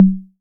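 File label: 808 low conga, Tape; Tape808; Goldbaby Tape808 & Tape909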